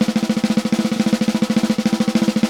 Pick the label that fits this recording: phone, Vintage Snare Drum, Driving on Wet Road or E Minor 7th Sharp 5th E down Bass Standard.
Vintage Snare Drum